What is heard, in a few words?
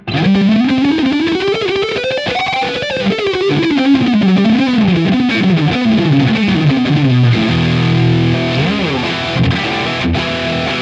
Guitar Rig preset Metal